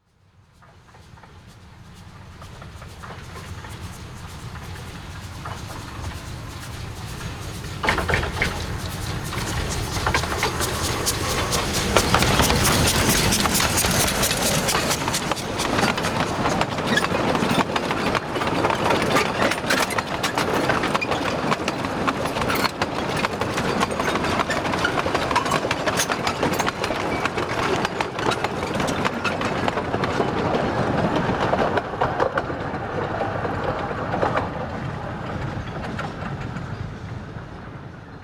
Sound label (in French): trains a vapeur a voie etroite; sonotheque